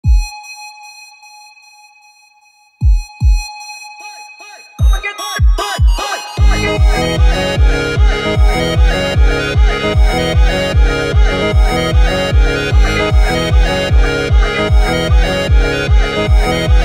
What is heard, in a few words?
speed up remix